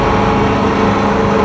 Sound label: door; cstrike; dm malevolence